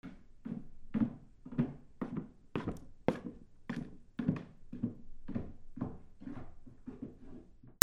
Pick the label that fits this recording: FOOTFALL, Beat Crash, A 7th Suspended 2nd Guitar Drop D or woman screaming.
FOOTFALL